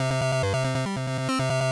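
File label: 140 bpm C synth, Trance Synth Loops; Trance Explosion Vol1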